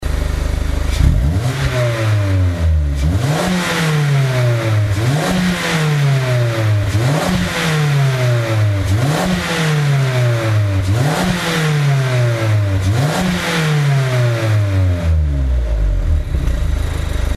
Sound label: Mazda MX5, Muffler; AutoExe